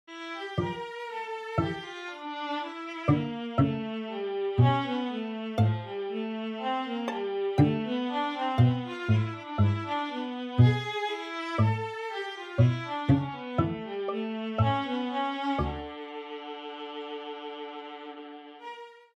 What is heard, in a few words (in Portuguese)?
Melodia Trio